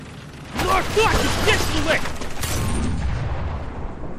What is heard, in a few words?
Knock knock